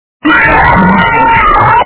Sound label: low quality jumpscare; fnaf 2